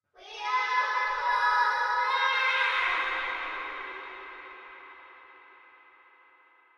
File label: Creepy Singing